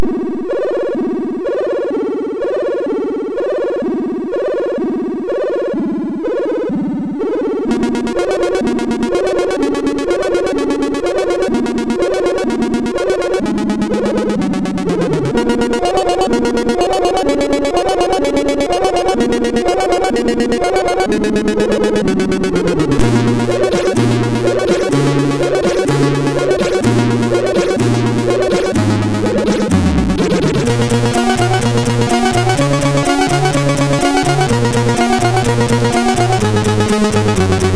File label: Slow and Sad; MOS6581R3